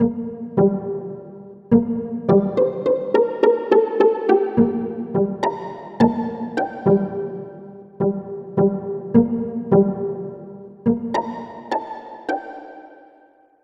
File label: Bass; U G M Smog4